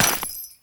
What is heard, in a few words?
Step Ice, Footsteps; Warframe; Grineer; Royal Jester; Foley